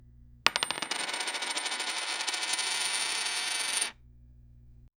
a coin fall on the

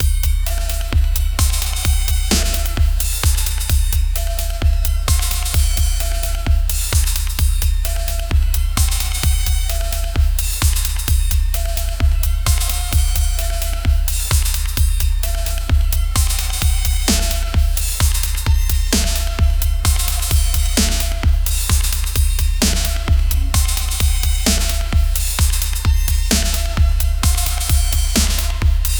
drum loop with 130 bpm

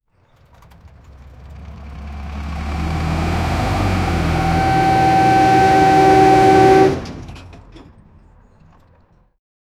location loading dock the, trucks

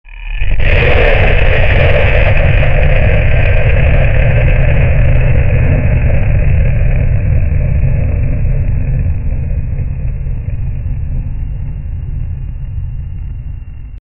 the sound of liquid rocke, launch

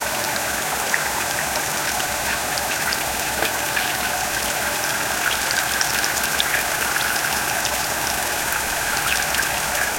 samosa fry

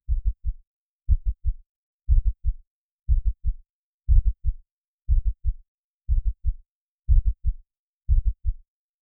heartbeat pulse at 65 bpm

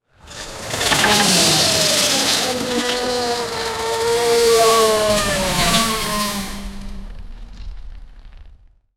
shopping cart derailing

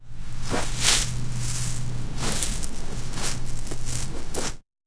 a man walking on sand; environment

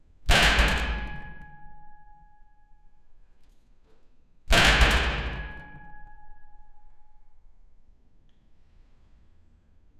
heavy iron jail cell door, prison

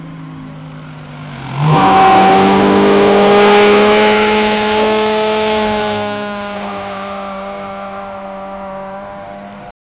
aggressive loud sustained, motorsport

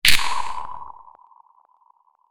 a quick whip sound followed by an echo

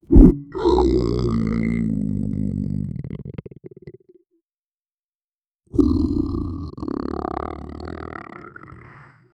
venom sounds slurping

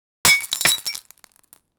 the sound of a champagne

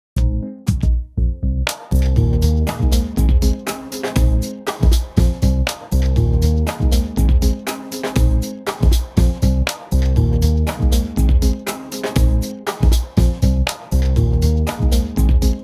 funky groove; 47 second

